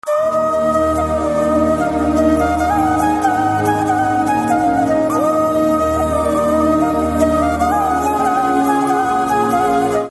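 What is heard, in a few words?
BGM Instrumental Ringtone; Eeswaran; Tamil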